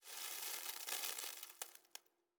ambient; World of Tanks

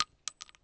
Inf Weapons; Red Orchestra 41-45

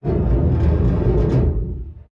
damage vehicle; World Of Warships

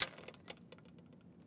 door chain link impact; Rust

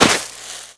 foot sand gritty, footsteps

